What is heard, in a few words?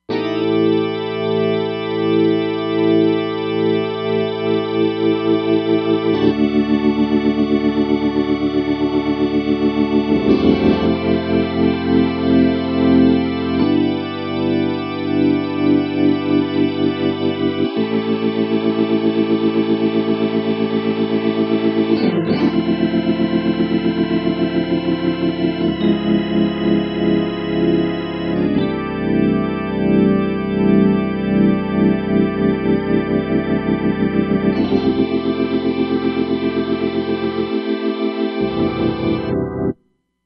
FullDraw Org, Roland Fantom XR